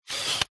technology camera dslr lense zoom manual turn canon eos 6d; zapsplat